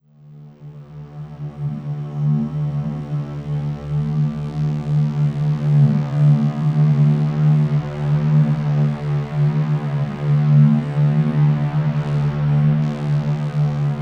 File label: Long Atmosphere; Sonic Foundry (Sony Creative Software); Slow Silhouette